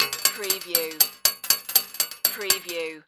Bullet Shell Drop Machine Gun Multiple Metal

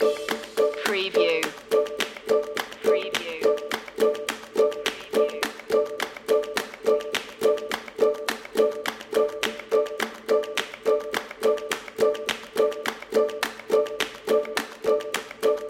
clean path loop

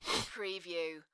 Sniff; beanstalk audio

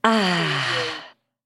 Female Ahh Sigh

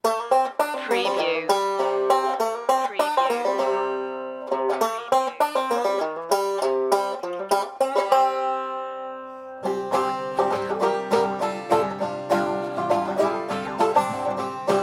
stomp

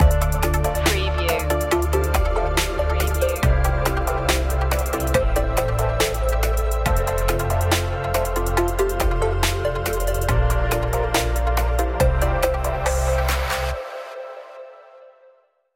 glamour downtempo; 15s

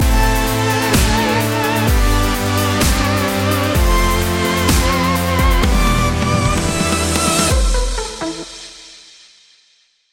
dubstep stinger

